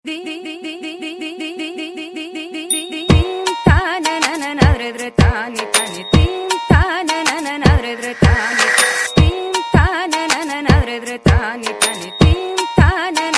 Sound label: ting tong, Ringtones